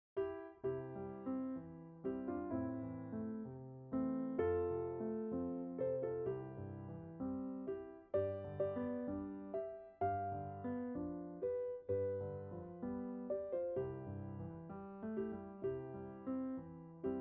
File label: Piano; Vietnamese Arrangements